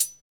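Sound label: hi hat; Best Service Gigapack 2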